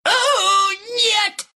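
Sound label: pain, Russian; dota2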